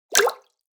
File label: catch fish true